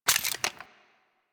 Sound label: Revolver Rifle Reload; Warframe; Tenno